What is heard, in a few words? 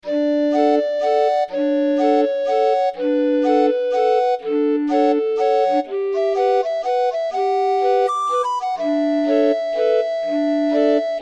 orgue barbarie